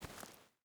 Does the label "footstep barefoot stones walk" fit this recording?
Yes